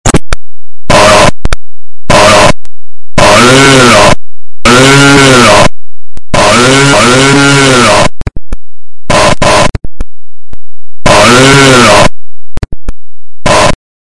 auuugh

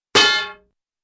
frying pan clank

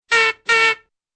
rc horn honks

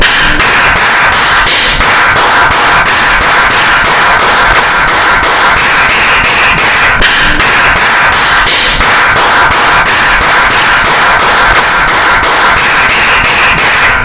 lotta claps

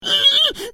falling; bad piggies ross

